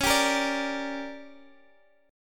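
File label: D Major 7th Suspended 4th Sharp 5th, D flat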